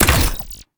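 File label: archet, impact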